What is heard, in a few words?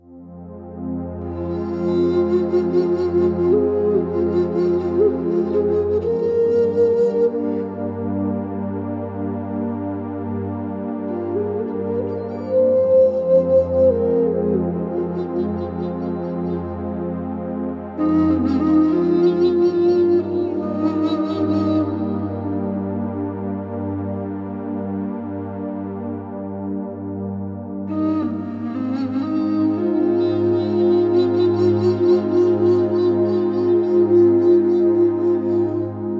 Jazz Music Therapy for Dogs